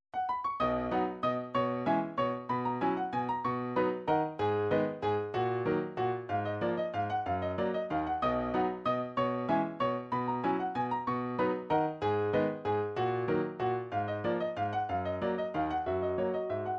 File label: waltz, midi